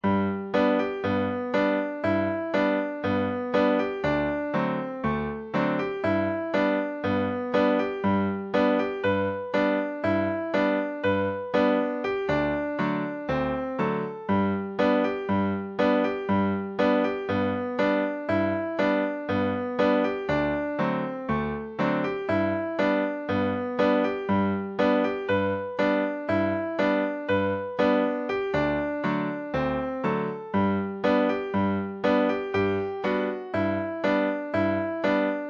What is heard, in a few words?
buffalo gals banjo